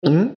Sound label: glug